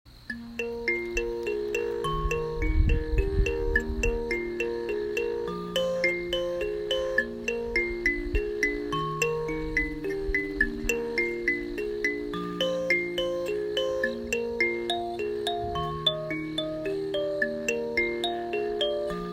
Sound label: chemutengure, mbira